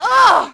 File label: fall; Splashdown; XBOX; Brit